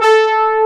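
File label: synth brass 3, tones; cubic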